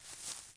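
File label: grass walk, footsteps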